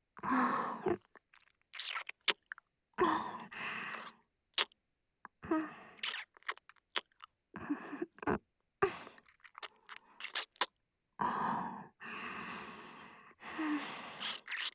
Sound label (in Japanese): キス（接吻）